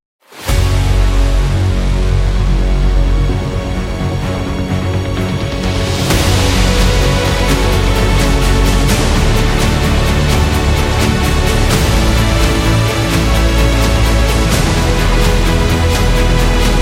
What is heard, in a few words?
Grandeur